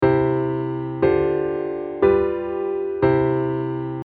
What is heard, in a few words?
Csus4